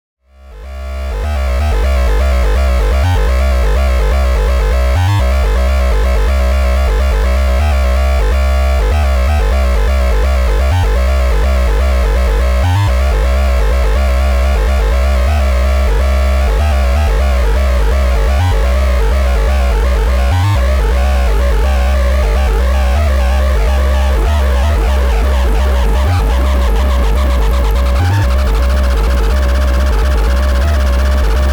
Xaoc Devices Leibniz Binary Subsystem Jena Phase Modulation